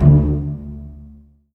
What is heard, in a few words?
D1, Virtuoso Strings; ILIO; Kirk Hunter Virtuoso Strings VOL-2; 10CL TRM FX